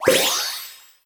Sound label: Enemy Teapot Teacup Summon Motion